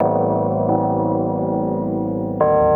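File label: KEYS loop R, Drum & Bass 175 BPM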